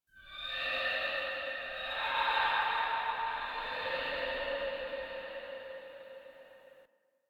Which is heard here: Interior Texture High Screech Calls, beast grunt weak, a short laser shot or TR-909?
Interior Texture High Screech Calls